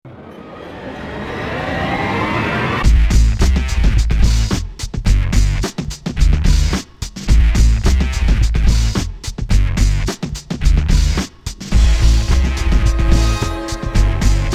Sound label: orchestral hip hop funky mix